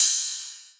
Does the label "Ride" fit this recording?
Yes